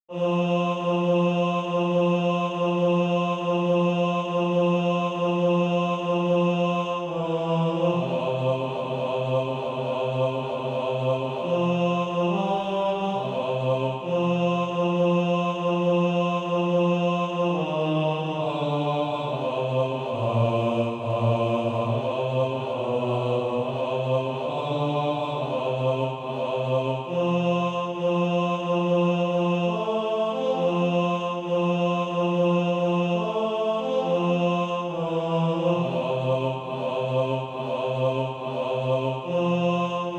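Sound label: bass, SATB Practise Music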